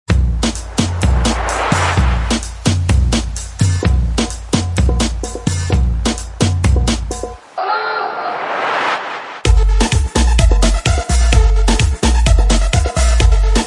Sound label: (Instrumental Version)